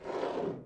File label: slide